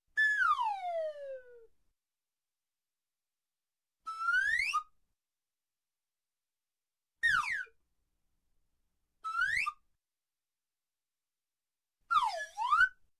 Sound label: Whistle, Slide slide, down, Comedy; Sound Ideas 2000 Series